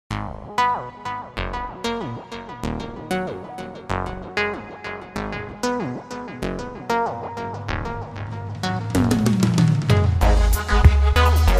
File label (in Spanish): Reggaeton Comercial Pista